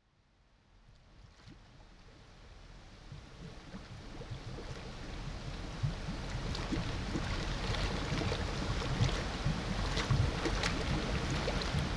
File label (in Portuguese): água e vento; Luís Antero